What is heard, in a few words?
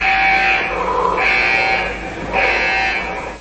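alarming horn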